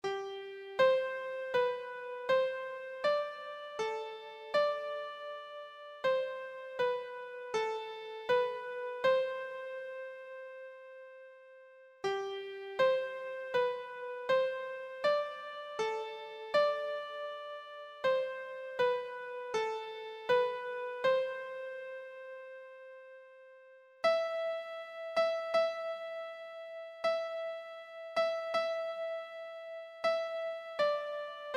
aura lea, keyboard

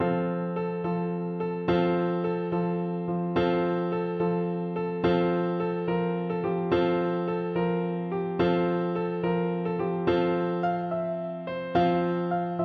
serenade, Flute; berlioz

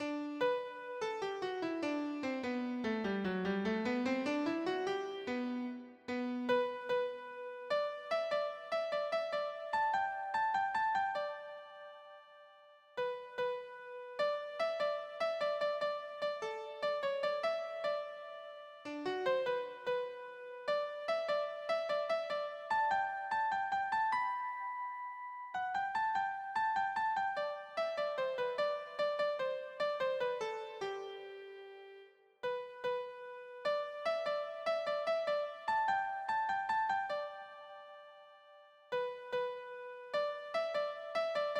the easy winners, keyboard

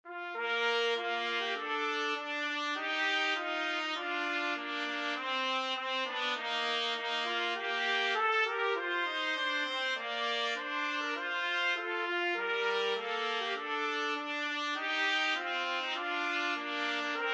national anthem russia 2 trumpet; trumpet duet

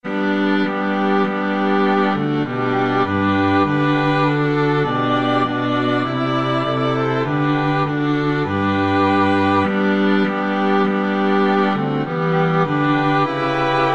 hark, string quartet

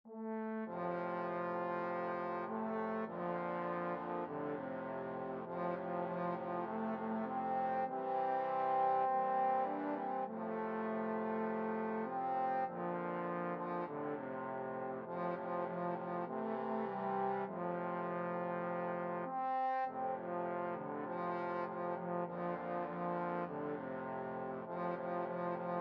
trombone quartet

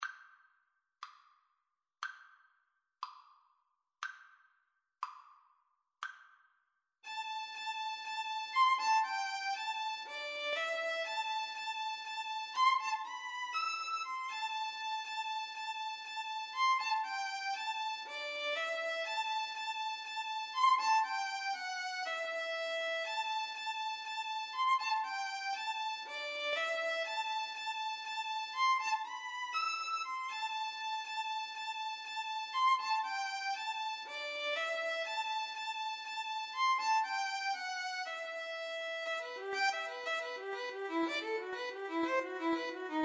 Violin-Viola Duet; dvorak op98

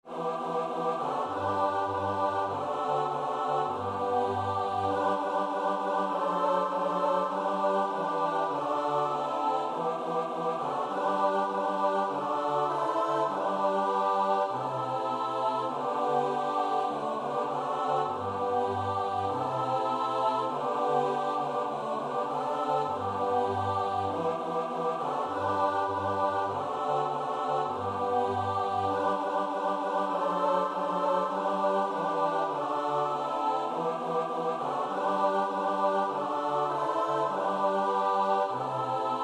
choir; nkosi sikelel

